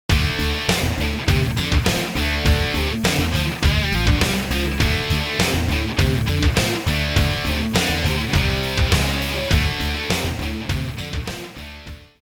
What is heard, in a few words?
HROCK